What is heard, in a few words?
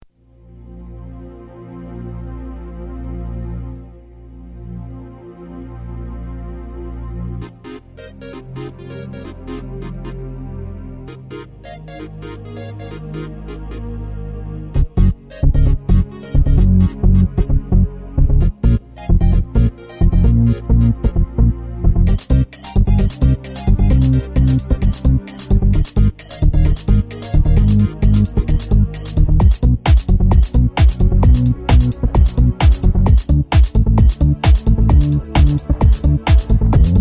electronic turn